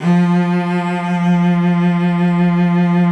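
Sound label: F3, cello vibrato; Xsample VOL-7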